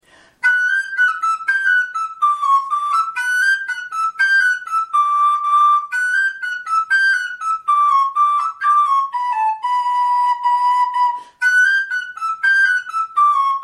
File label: hora, flute